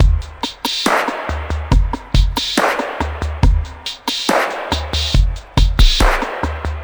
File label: SloMo Beats, Loops 140 BPM